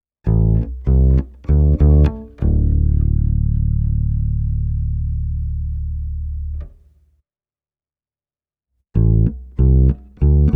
6 string bass b band both emg; savisalo

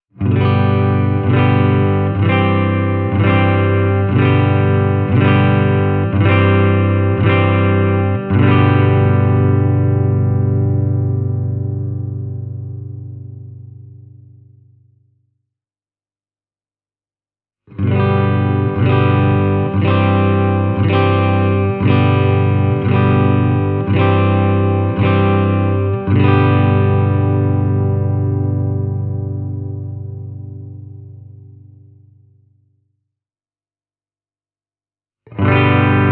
ltd te 200 clean

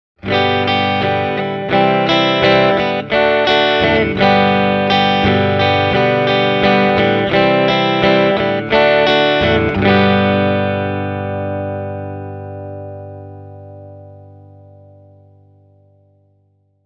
albion tct35 clean, guitar; hamer

